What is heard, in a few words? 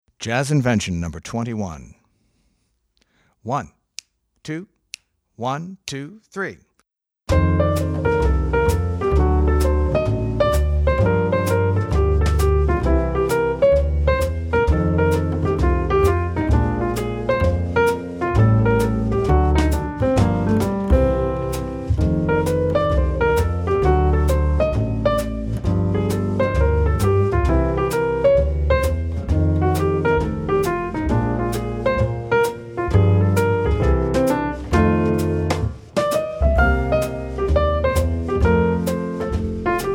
Jazz Invention, piano; Jazz Inventions for Keyboard